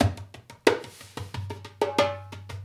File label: Dumbek; Essential Percussion